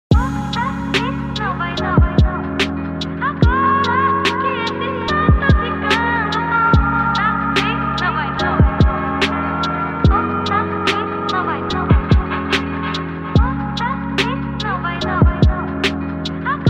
LOFI REMIX RINGTONE

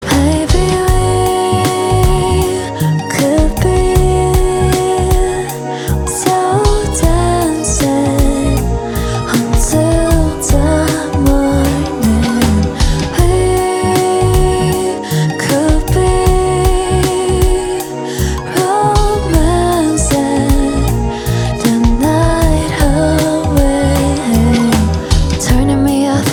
cover ringtone

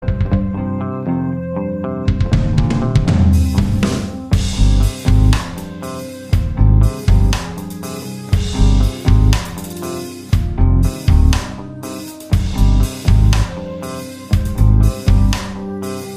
epic instrumental ringtone

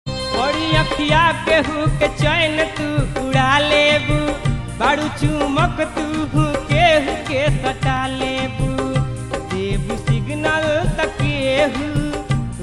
BHOJPURI RINGTONE

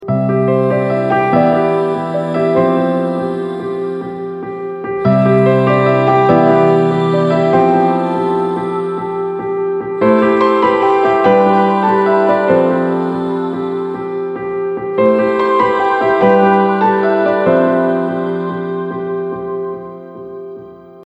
angel piano ringtone

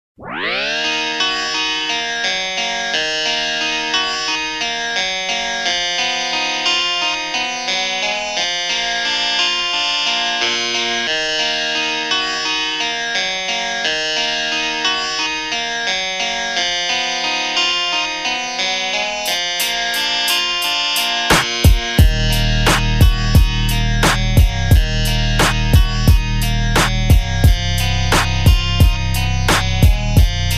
instrumental ringtone

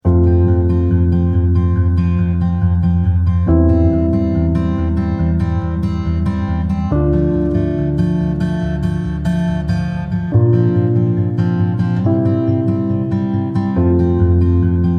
Christmas tree instrumental ringtone

